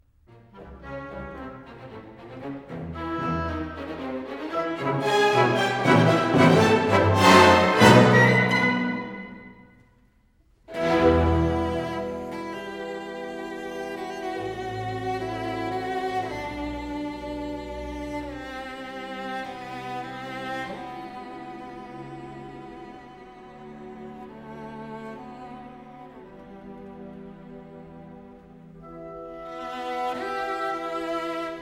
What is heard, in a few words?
Concerto for Cello and Orchestra in E minor, Finale